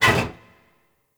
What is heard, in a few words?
Frame Movement; Warframe; Vay Hek